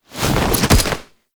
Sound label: Board Flip; Boarding Rail Jack End